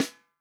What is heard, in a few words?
FUNK SNARE CHROMATIC, REAL DRUMS; Best Service ProSamples vol.43 - Real Drum Kits